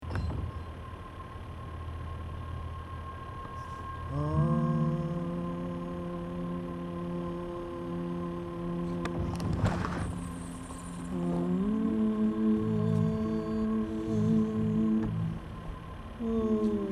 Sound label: duet with machine